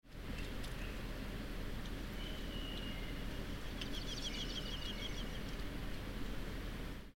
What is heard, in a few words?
duck flyover